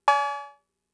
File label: Cowbell; Fricke MFB-522